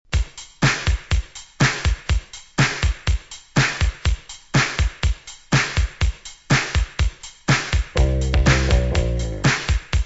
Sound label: flamethrower